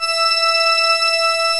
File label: ORGAN & BRASS